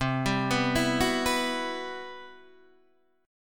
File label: C-Major Sharp 11th, Guitar DADGAD